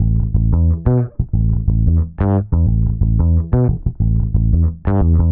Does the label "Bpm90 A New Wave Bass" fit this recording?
Yes